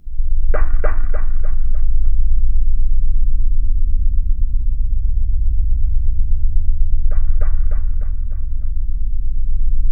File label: C1, Synth Leads